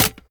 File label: ar akilo47 drum reload empty fast mag in; weapons; viper